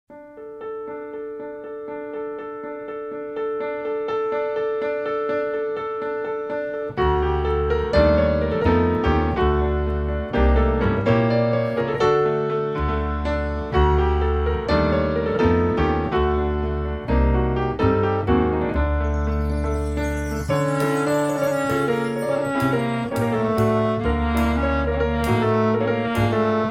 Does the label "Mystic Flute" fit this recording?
Yes